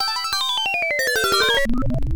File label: Death; athena snk triple z80 arcade